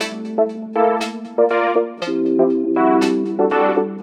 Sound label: Synth Progstab; Sonic Foundry (Sony Creative Software); Knutrix Drum & Bass